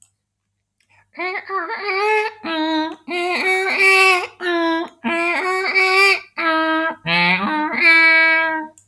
Music Musical instrument Percussion Mallet percussion Vibraphone, vocal imitation